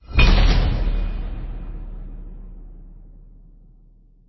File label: notify match ready; smite